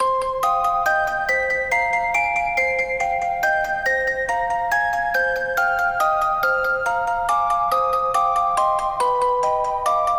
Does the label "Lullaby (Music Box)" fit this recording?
Yes